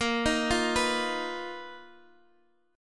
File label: B Major 7th Flat 5th Bb, chord shape